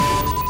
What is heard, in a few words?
test signal